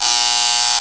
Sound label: time Warning; blobAndConquer; combat